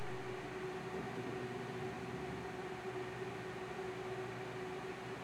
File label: Pod Open-Start Fan-Loop; viewfinder; Intro Vf-Pod